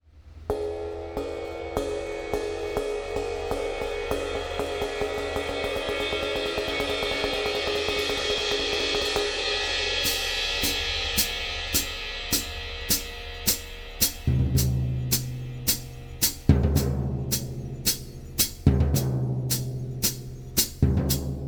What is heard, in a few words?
drum and cymbals